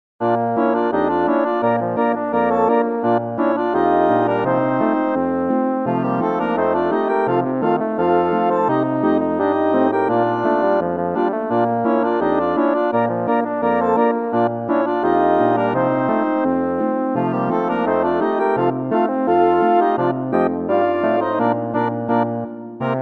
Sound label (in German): Boarischer